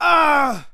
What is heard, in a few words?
"ah", pain; ooh ahh